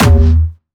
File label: Jumpstyle Kick E2; Jumpstyle Multis; vengeance essential club sounds-3; VEC3 Bassdrums